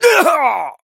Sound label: pain death; Operative